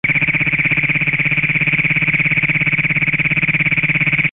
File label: bufo cognatus great plains toad